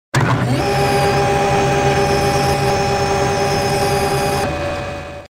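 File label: tiger roll start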